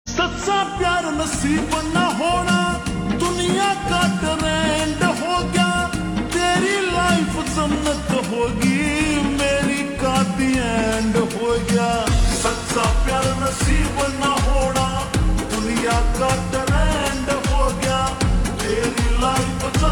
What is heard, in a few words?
Slow Reverb Version Ringtone